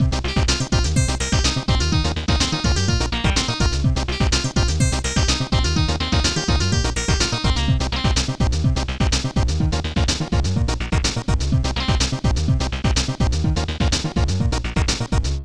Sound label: keygen song